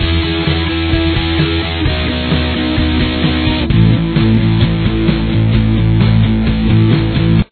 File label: chorus, guitar